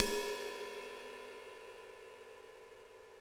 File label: Ride Acoustic Dust